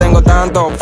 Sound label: tranky funky